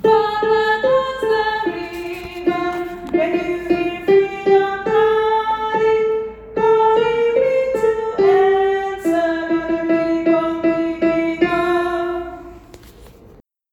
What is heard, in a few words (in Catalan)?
Tenors - compassos 52...56 (far across the river); veu